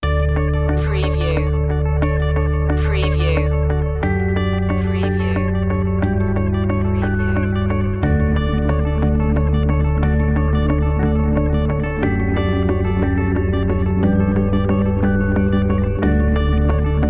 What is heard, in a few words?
subtle achievement